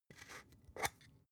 spice jar scratch tonal open hard; industrie wirtschaft; gastronomie

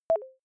notification message ring, signale

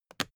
briefcase plastic latch lock close; industrie wirtschaft; bueroarbeit

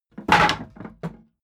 wood board drop rumble; objekte; holz

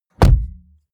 car bmw e46 interior door rear close hard; Cars

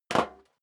step sneaker metal dry single stair up; schritte

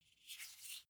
car flip key handling short

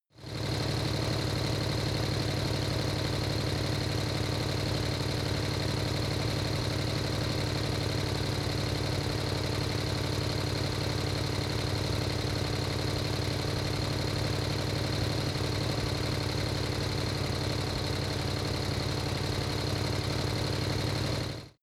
motorcycle harley drive slow

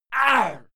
male fight attack; soundpool

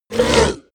ogre attack; kreaturen

